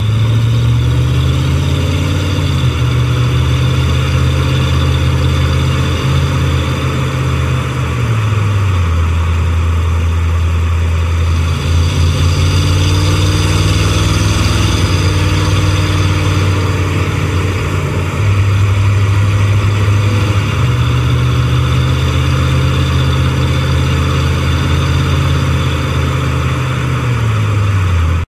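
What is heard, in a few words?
vehicle start loop taxi; The Sims 3; Windows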